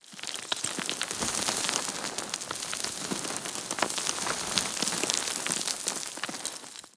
slide loop; aragami

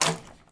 Wet Footstep; backrooms 2d android ios online windows gamerip

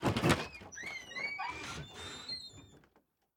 Saloon Door; The Town; Devour 2021 gamerip pc macos

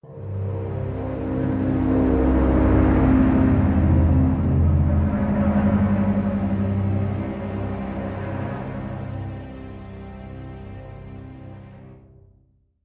Ominous; the walking dead michonne 2 give no shelter

